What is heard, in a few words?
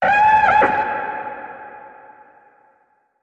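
Alarm Rattled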